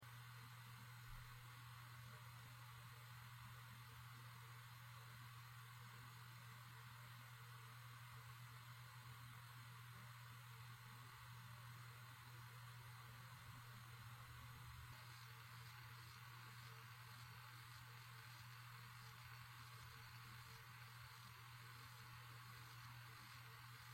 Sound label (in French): hitachi e7k500